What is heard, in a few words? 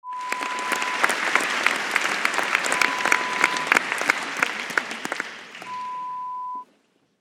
Applause Large Hall